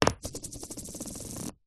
foley money coin set down on paper and spin; zapsplat

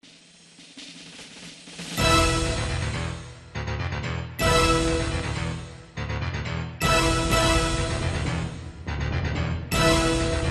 Military Madness; audio hero

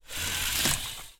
household blind venetian up

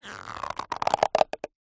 balloon popped skin rubber stretch squeak

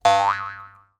cartoon spring bounce twang

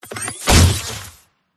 weapon gun gunshot futuristic; PMSFX

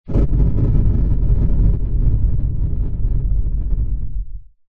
nature fire flame large burst

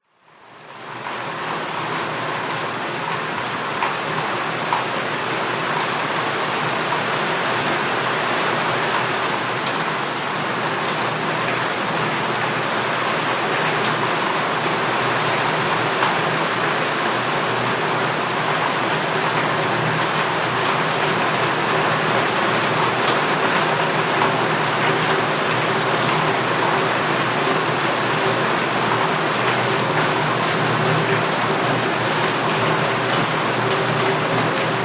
rain falling metal roof inside house pov

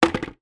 household bucket plastic place inside another; zapsplat

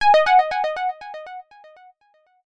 multimedia alert system

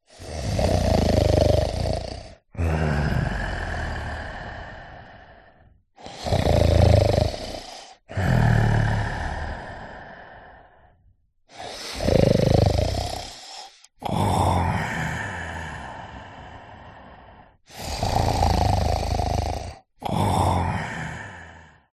human man elderly snore; zapsplat

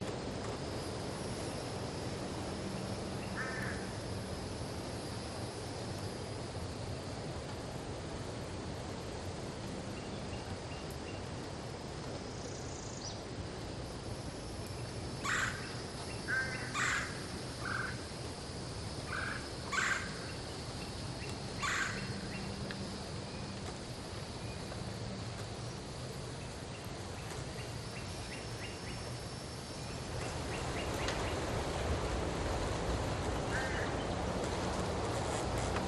woods; sound spark

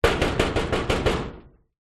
household knocking metal garage door

